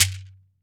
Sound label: MARACA TONE; AKAI S-Series CD-ROM Sound Library VOL-5; SHAKER.MARAC